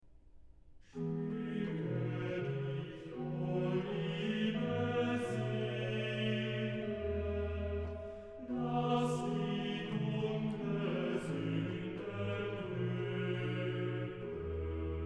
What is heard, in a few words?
Chorale, organ; BWV0180; Schmьcke dich, o liebe Seele; BACH; BACH ORGAN WORKS; CHORALE PRELUDES